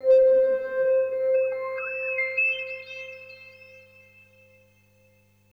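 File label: synth, 140 BPM, drum & bass; Ueberschall; Drum & Bass Resonance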